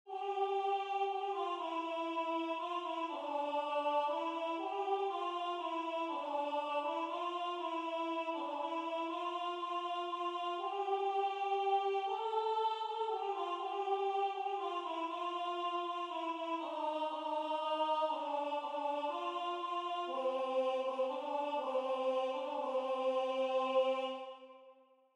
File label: Candlelight Carol alto